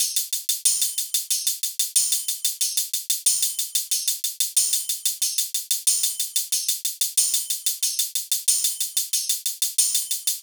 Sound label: hat loop 92, Drums; Pop; Hat Loops; Slate; SD PASADENA Pop Sample Pack